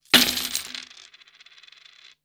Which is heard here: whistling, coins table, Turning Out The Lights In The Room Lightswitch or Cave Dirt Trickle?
coins table